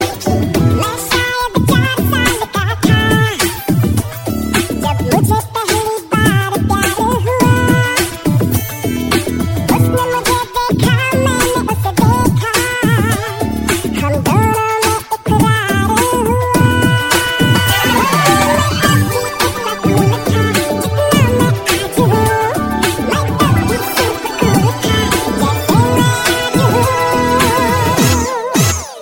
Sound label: DUCK FUNNY RINGTONE